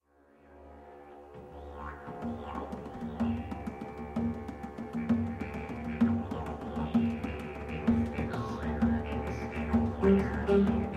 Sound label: Jig; Berlins Jig